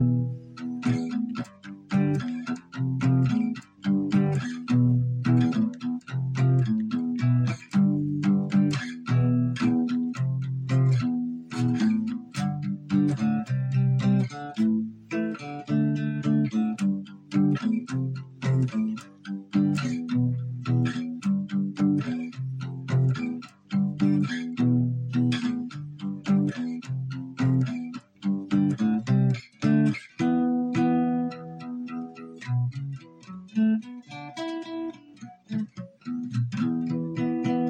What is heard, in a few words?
guitar